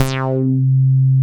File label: BASS C4; JGL BASS; Jungle; USB Soundscan vol.05; Explosive Jungle; AKAI; 90s sample CD